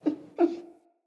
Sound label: Wolf Cub Sleep Idle Yip; Vocals; Animals; Orengal Wolf Cub